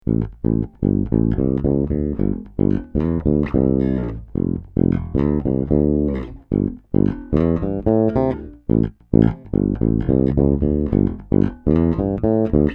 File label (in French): Did C Passif, basses